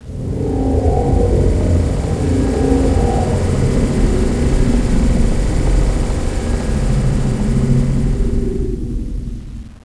Borg Engine

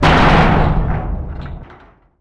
Collision, Explosion

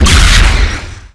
black elm cardassian torpedo

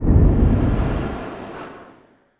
Player Seal, Plasma; SpecialFX; NanoFXv2

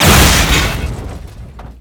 console explo, Bridge; sfx

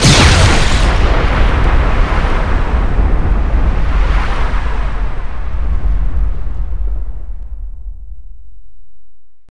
nova, Explosion; NanoFXv2; ExplosionFX; ExpNova

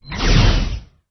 Miradorn Beam

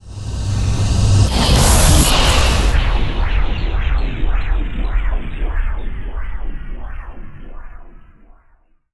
Kessok Exit Warp; NanoFXv2; WarpFX

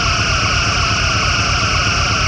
Phaser; Weapons

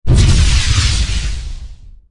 Hit Shield, Explosion; NanoFXv2; ExplosionFX; ExpShield

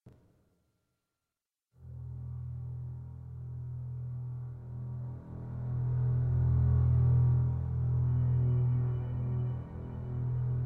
Music; DS9FX

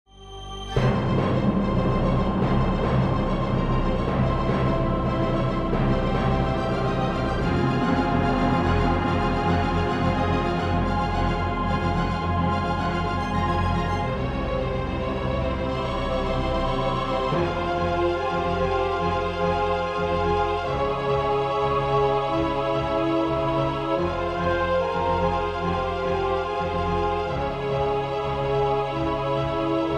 confident

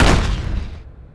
shield; NanoFXv2; ExplosionFX; ExpShield

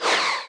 cardassian beam

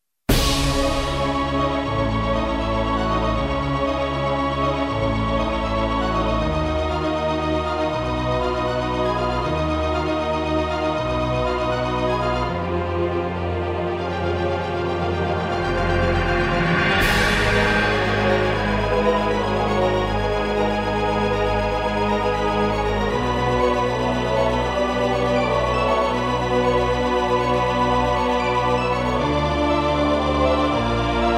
panic

